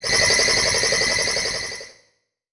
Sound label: Multimedia Internet CD-Rom Flash Animation